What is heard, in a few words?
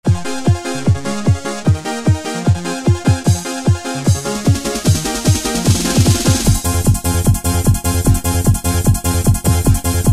Eurobeat, Yamaha QY70; Preset Styles